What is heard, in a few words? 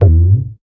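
percussion G1, Tabla + Sitar, MIRAGE; ProSamples vol.55 - Retro Sampler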